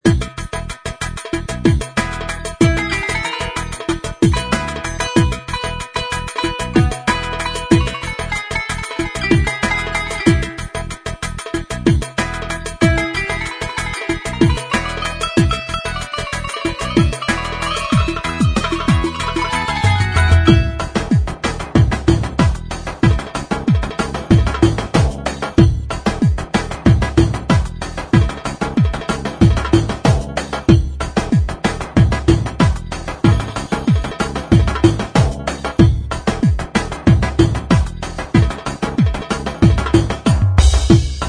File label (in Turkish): style ciffetelli; gem genesys pro oriental